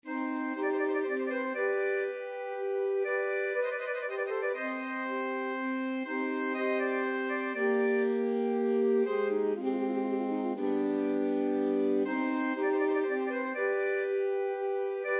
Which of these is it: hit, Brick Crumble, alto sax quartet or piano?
alto sax quartet